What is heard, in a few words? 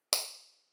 Sweep Impulse Response